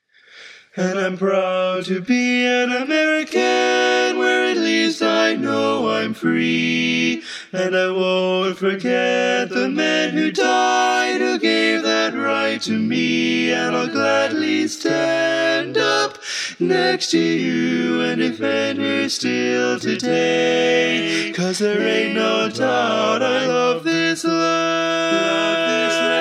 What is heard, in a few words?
Lead